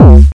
BD, Drums Dance & Groove; USB Soundscan vol.11; AKAI

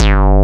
1 Shot Perc G3; Digital Sound Factory Xtreme Lead

